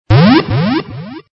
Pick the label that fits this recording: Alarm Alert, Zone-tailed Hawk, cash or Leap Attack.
Alarm Alert